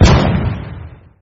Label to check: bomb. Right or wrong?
Right